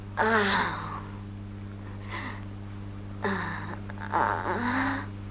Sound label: oh hum